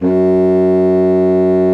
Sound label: F#2, bassoon; Xsample VOL-6